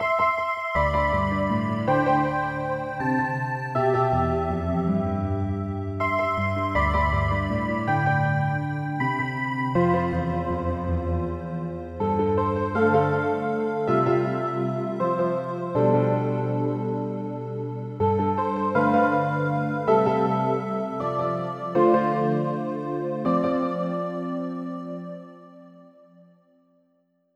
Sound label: Perished (Game Over); Iconoclasts